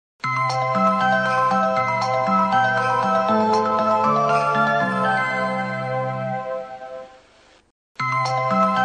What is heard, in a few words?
akihabara station